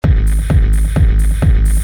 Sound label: loop